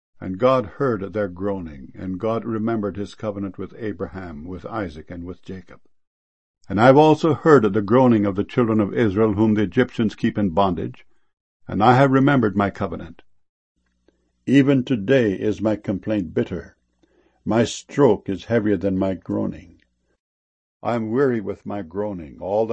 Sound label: groaning